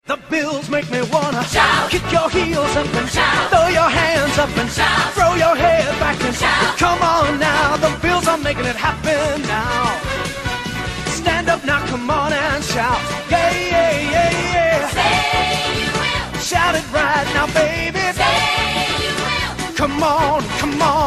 SONG IPHONE RINGTONE

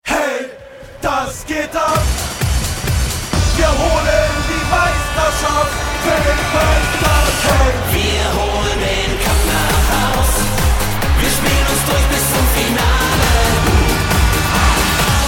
CHEER RINGTONE

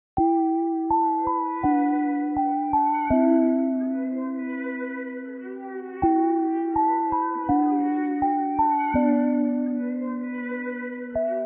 DEEP STORYTELLING HIP HOP RAP BEAT RINGTONE

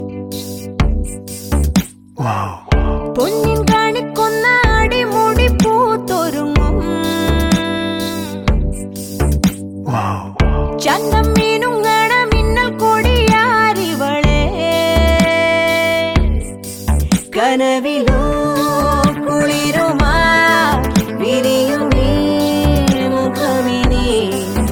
MALAYALAM RINGTONE

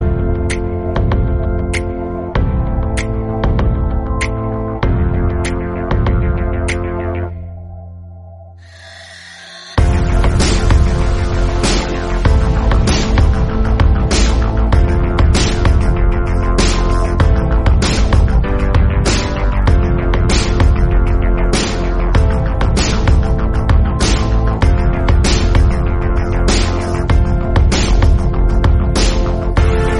INSTRUMENTAL RINGTONE

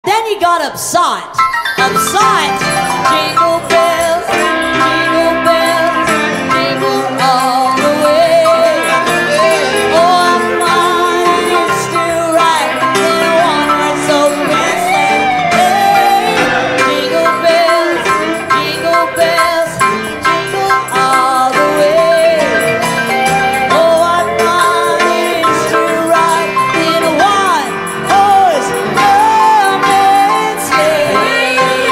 JINGLE BELLS LIVE RINGTONE

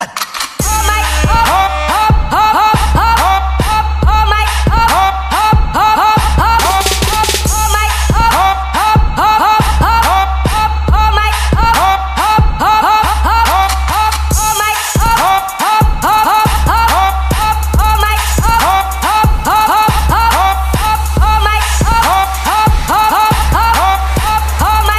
TRAP RINGTONE

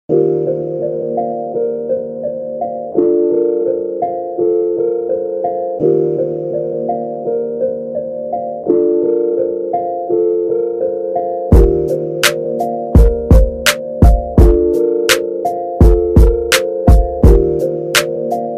instrumental ringtone

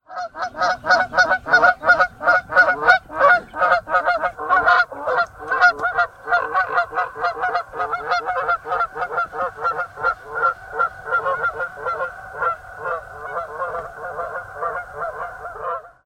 GEESE SOUND

